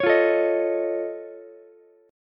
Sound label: F Augmented 7th F Ukulele, chord; F+7 strummed; JGuitar